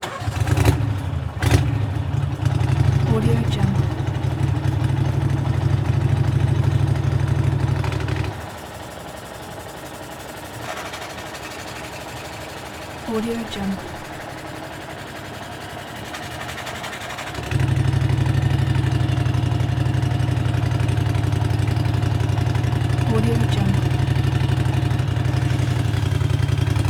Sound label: boat fire pump run